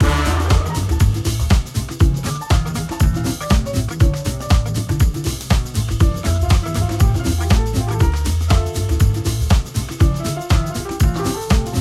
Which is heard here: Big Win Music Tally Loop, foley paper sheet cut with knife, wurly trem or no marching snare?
Big Win Music Tally Loop